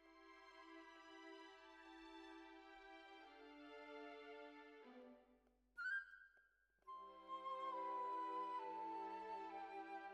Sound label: Bassoon, Jazz for piano